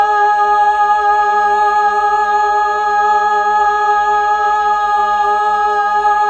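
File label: Fem G sharp 2, Mellotron Female Choir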